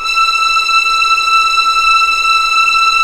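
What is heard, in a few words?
E6 legato, violins; East West; The Ultimate String Collection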